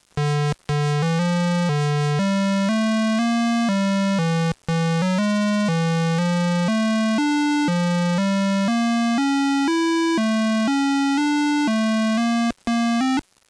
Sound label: Descending